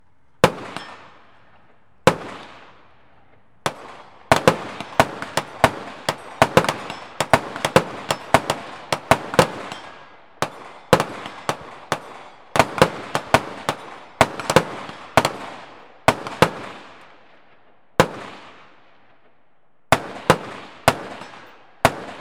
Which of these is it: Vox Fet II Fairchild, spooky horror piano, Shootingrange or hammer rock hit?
Shootingrange